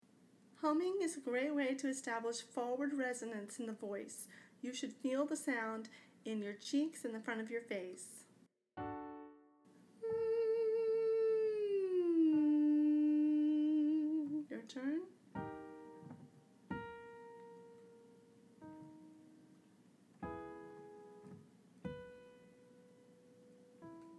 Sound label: singing hv mmm 5-1 slide